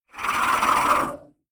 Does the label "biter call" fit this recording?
Yes